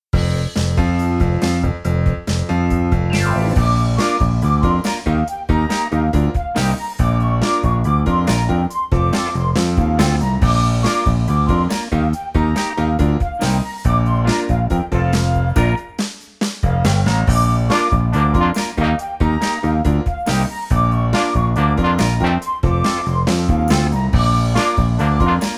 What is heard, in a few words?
Retro Game Show